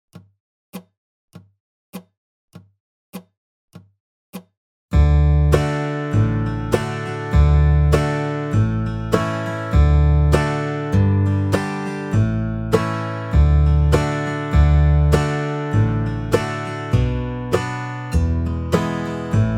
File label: backing track 50 BPM; Hark! The Herald Angels Sing; Holiday 2025 Dobro Zoom Workshops